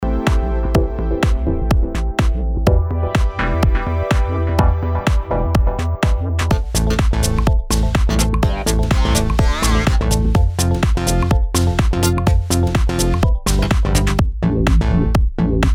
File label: House